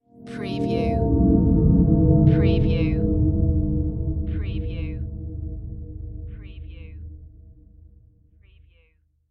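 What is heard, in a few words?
Production Element Swell Eerie; Sound Effects Bible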